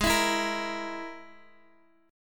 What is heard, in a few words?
Ab Suspended 2nd Sharp 5th Ab down Guitar DADGAD; strummed; JGuitar